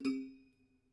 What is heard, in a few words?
Prepared Piano